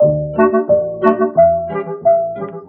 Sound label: keys, Phonky loops